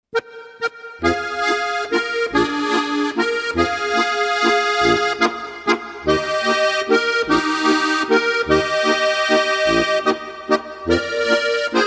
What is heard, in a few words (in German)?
walzer